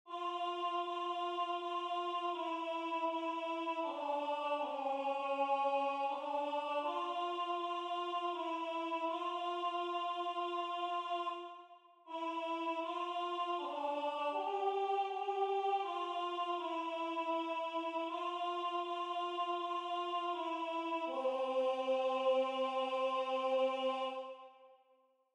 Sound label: Alto